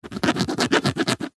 sport rugby ball scrape scratch surface with finger as if removing mark; zapsplat